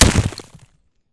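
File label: Bullet Light Ballistic Dirt impact; Titanfall 2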